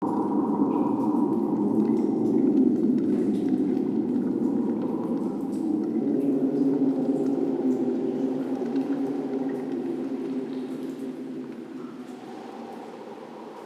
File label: Root Cave Bed Loop, Ambience; Neathuns Root Cave